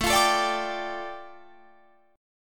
A 7th Suspended 4th Sharp 5th, A, down, Guitar, Drop D